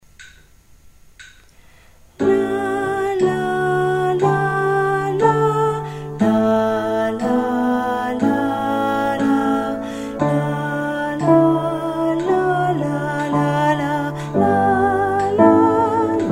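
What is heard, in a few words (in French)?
basse tempo 60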